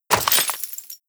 debris pottery, bullet impact debris

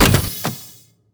bump mine land; Counter Strike Model Pack; CS:GO; survival

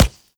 sand, bullet impacts; physics; Half Life Model Pack

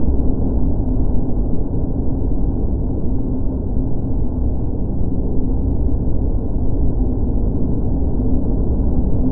interior; ambient; Counter Strike Model Pack; CS:GO; dust2

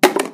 paintcan impact hard; physics; metal

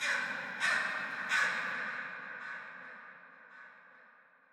crow, ambient, nature, birds; Portal 2

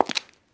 footstep concrete

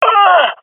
death, swat fem; vo; Counter Strike Model Pack; CS:GO

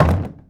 underground cube soft; Portal 2

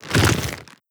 step movement, zombie blind, foley; creatures

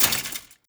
step, footsteps, player, metal chainlink

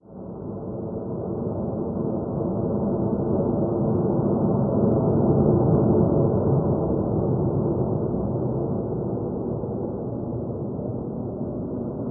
airplane, ambient; nuke

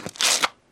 foot scuff concrete

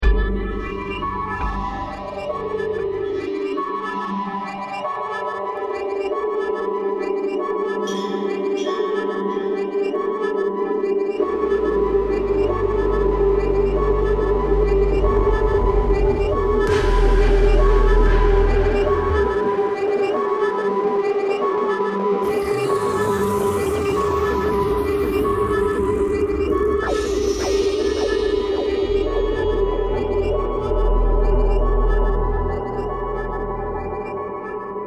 bomb planted

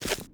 grass, footsteps

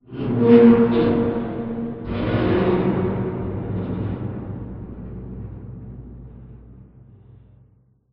amb apocalypse wronk, ambient city

